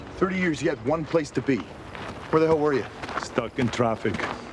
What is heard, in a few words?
stuck in traffic; Terminator; Genisys